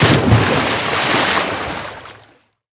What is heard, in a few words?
splash; PixelPerfectionCE; minecraft